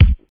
studio kick drum b key, kick drum single hit tuned to the B note